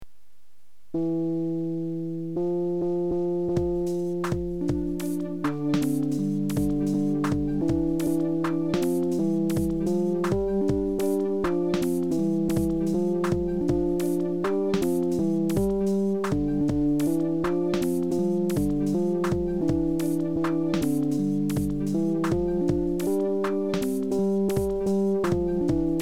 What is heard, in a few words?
beat